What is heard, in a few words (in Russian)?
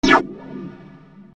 Rezki zvuk dlia perehoda